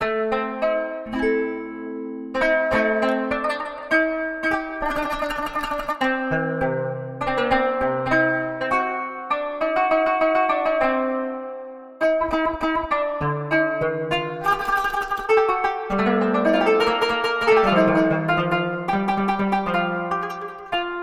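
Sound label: qanun arabic maqams; basam ayoub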